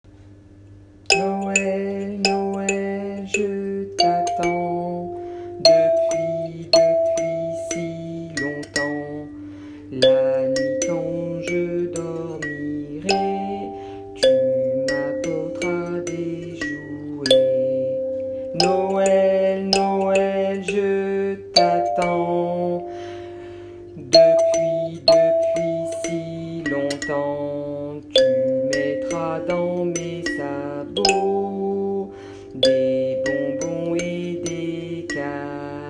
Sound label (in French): kalimba